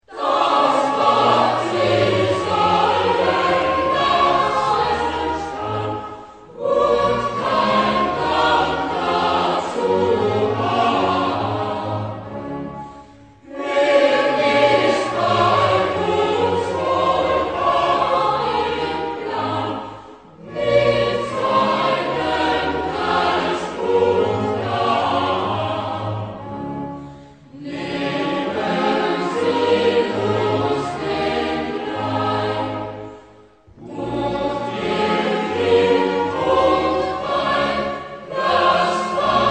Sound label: Choral; Bach; Ein feste Burg; BWV80 No08